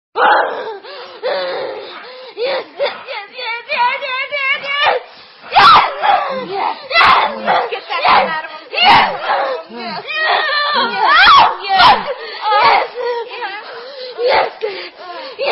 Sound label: Sexy Amateur Screamer